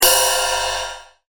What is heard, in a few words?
ride